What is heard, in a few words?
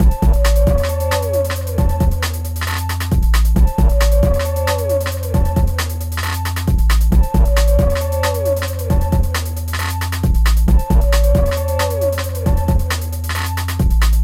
Hot DnB Loop